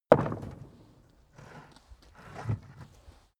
carriage hatch single faint

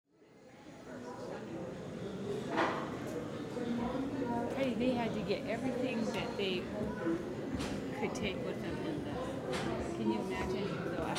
museum in usa; columbia

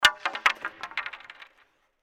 brawlers striking the ice

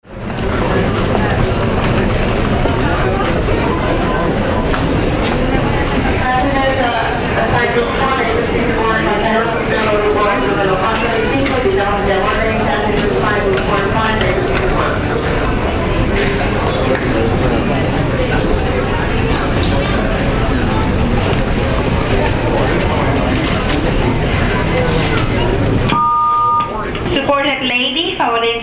airport hall with speaker

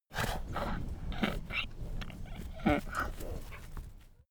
donkey grunt snort

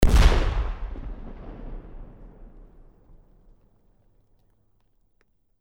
large explosion

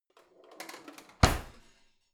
old drawer creaky in hard

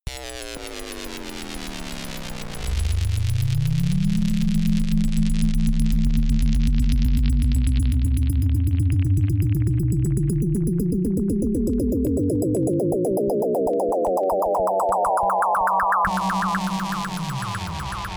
atonal rhythm drop riser

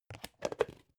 plastic bowl small close

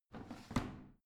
washing machine drawer out

